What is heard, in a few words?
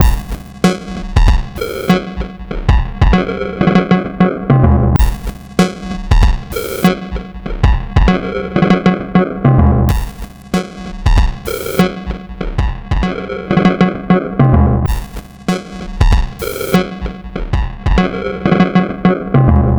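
drum, 097bpm, Breakbeat; Toe Jam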